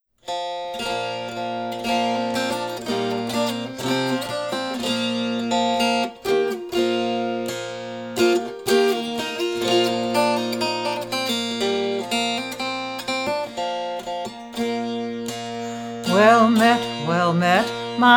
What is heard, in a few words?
House Carpenter